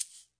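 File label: Shaker; Classic Hip Hop Mega Kit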